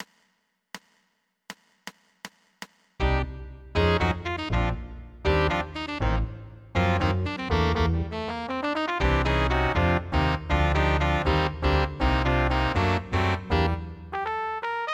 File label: Inst Septet Gm